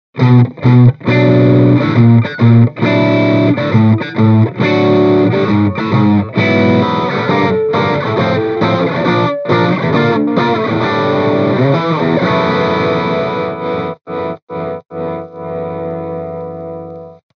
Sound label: Mini Rox Mic Inside PRIVATE JACK Overdrive Les Paul